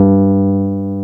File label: G1, Keyboards Old School; USB Soundscan vol.09; AKAI